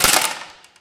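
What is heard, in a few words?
physics clipboard impact medium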